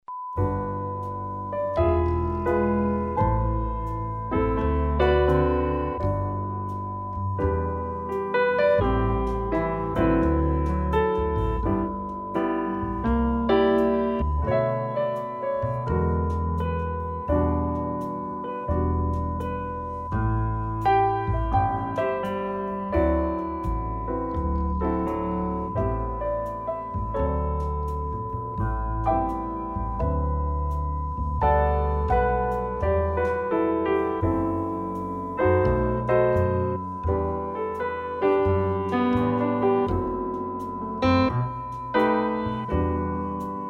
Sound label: cheerful time